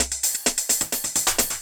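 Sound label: LOOP snare drum, Drum & Bass, 130 BPM; Ueberschall; Drum & Bass Resonance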